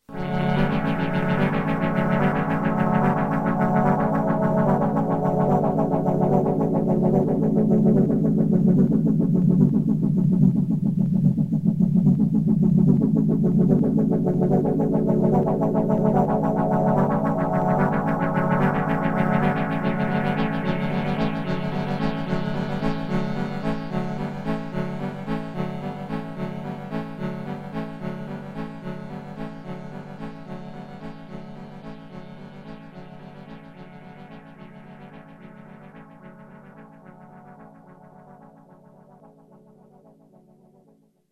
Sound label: RS370, Polyphonic harmonic generator, FB3 Filterbank; Use of the RS390 and FB3 Filterbank